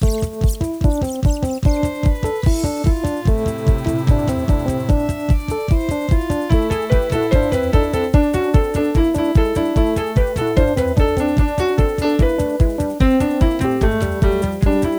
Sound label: Pop Instrumental